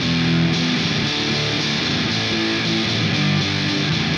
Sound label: Guitar, Indie Rock; Sonic Foundry (Sony Creative Software); Indie Rock Anthems; Session 06 - Haze